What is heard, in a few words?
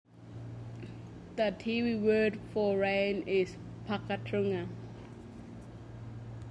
rain